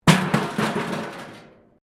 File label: metal rubbish bin smash concrete; Metal (Sound Effects:Materials